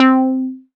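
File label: pluck C4, ARP Odyssey; Polyend; Simulacrum Sounds; 1 Shots; Synths; Keys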